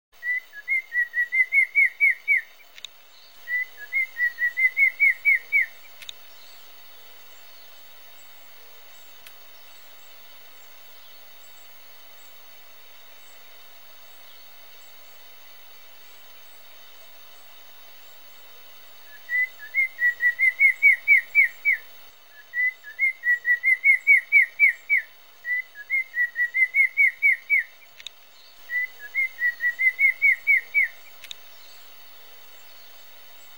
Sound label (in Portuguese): canto do torom torom